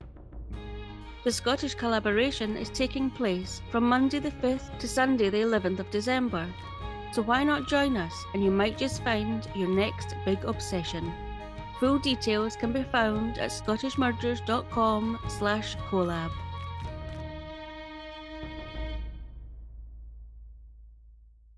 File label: jingle